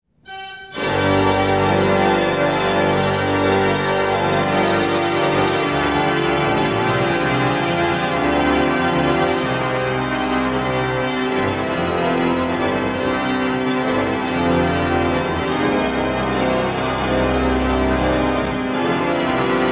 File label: Improvisation, organs; Improvisation sur un noel; St Merry; Disque Erato